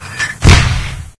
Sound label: forest mortar; ambience; Source Engine; dod